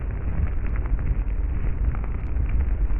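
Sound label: lava ambience quiet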